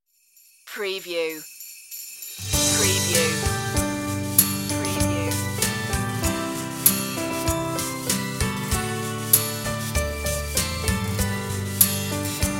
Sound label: children instr